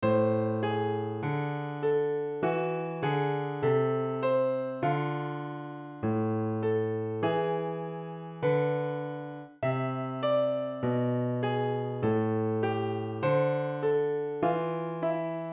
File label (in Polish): bas